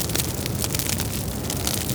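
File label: fire medium; emitters global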